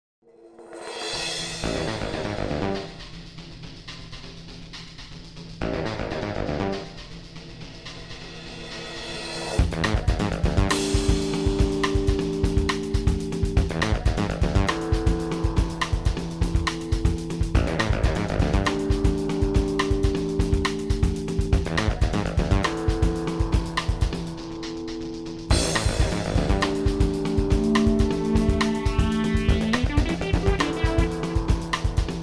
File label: Bass Groove